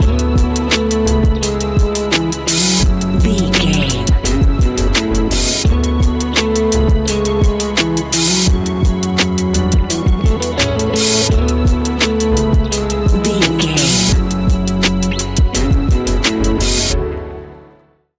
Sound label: technologic robot dreams, A relaxed and chilling piece of music